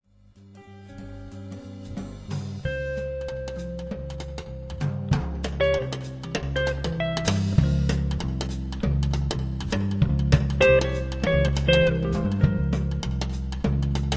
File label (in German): Trio arabisch